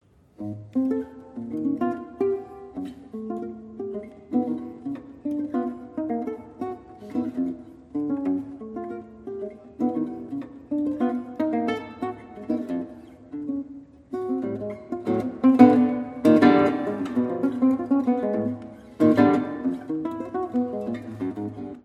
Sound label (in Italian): animoso